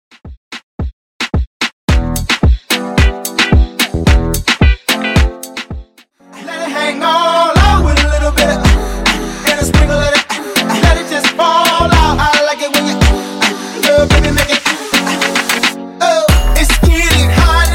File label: Segway Redrum; Clean n Dirty